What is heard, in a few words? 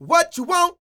SING, Vocal Planet R&B & Dance; ILIO